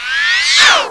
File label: restoration fail